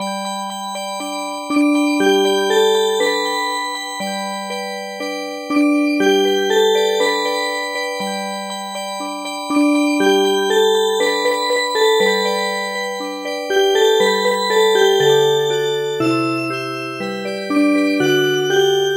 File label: Music Box